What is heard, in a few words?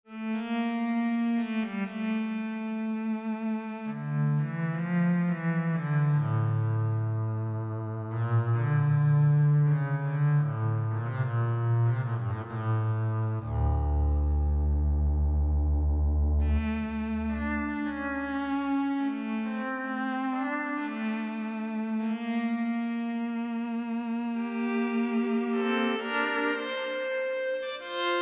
Cello